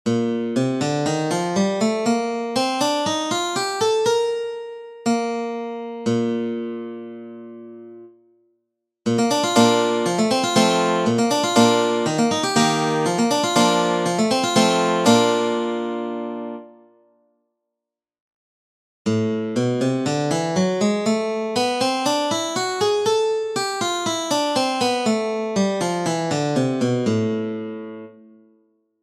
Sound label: Bb Major